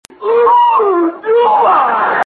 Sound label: Ooooh; Jack Benny